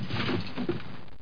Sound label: ruckus; landslor